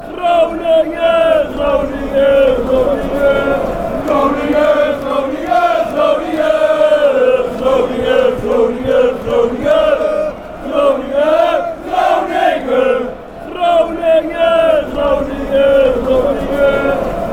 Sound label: groningen fanchants